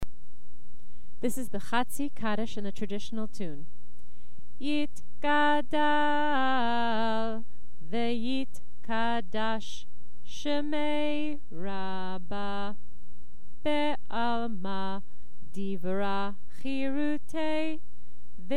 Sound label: Kaddish slow tune; Chatzi